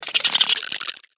Plated Beetle

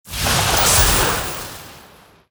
Lightning Attack

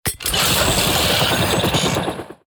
Claw Machine Play

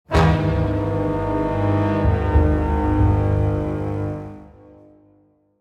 Play Stinger

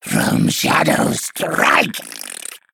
Male Mantid Attack

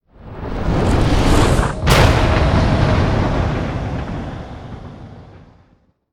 Darkportal Death

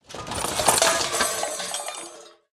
Equipment Debris Underlay Death

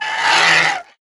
Whelp Enter Play; whelp token

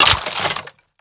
Broom Death

